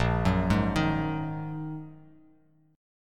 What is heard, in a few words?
A sharp 7th Suspended 4th Sharp 5th down; arpeggiated; JGuitar